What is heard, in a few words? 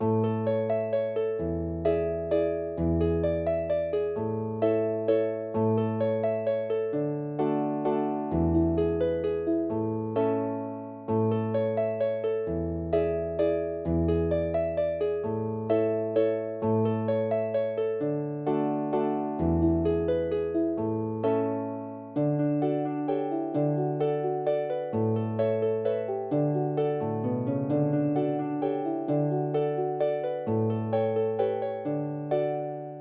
MAZURKA; F TARREGA